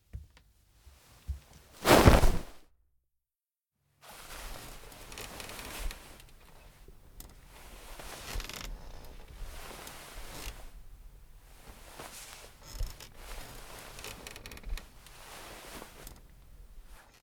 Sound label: Pass Out On A Bed; LOOPERS